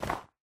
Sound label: snow, step; minecraft pe 0.6.1